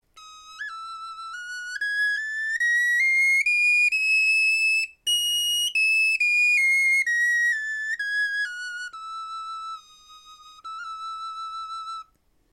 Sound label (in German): tonleiter, knochenfloeten